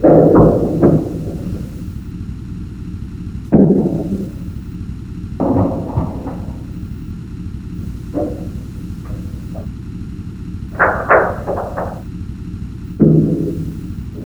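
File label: metal drum pings; Call of Duty Modern Warfare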